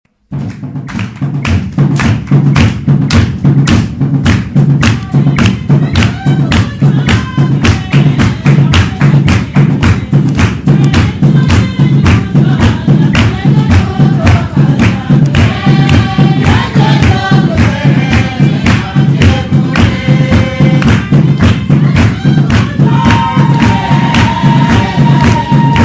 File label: Anuak singing